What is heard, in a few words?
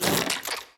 poop spawn; horizontalrunner